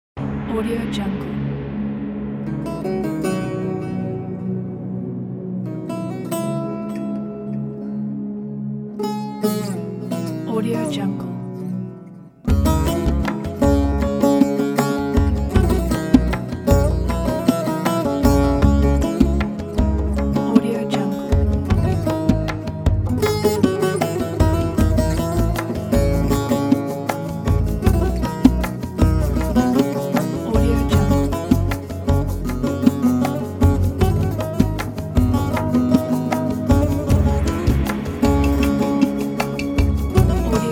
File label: arabic